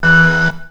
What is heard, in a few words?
chord, stabs